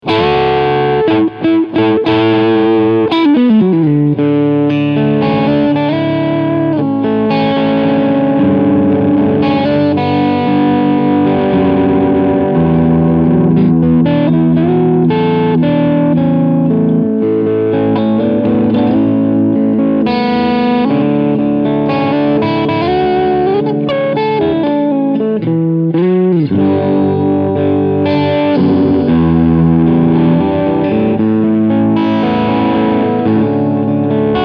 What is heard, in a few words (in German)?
Crunch beide SC Modus